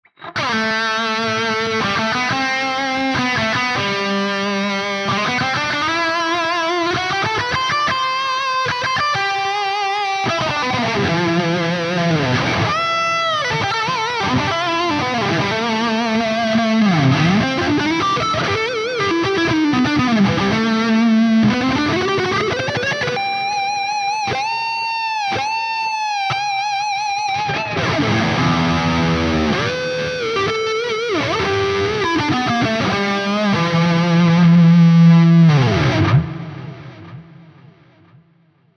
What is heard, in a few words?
Neural DSP Soldano SLO 100 Prog Lead